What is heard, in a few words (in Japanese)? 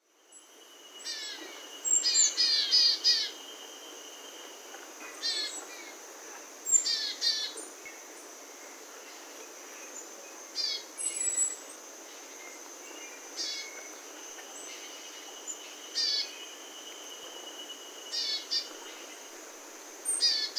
kogara; yaita